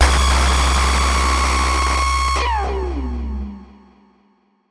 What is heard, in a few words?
Dark Age Synth; martyn stonehouse